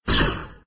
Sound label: Mech Projectile; Doodle Jump 2